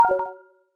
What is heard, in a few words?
Error